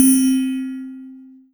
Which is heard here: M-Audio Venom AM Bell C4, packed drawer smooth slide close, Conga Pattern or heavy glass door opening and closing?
M-Audio Venom AM Bell C4